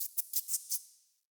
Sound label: Under City Tiny Creature; Ambience